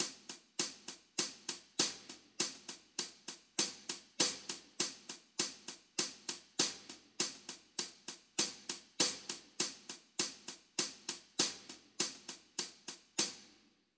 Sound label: Wave Drum SEP HH